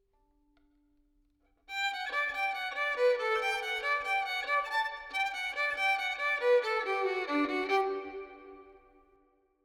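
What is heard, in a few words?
harmonium 3-4 70 verb; Sample Banks; 4-4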